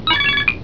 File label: Bleep